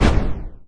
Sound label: camera hazard; Original TK Sounds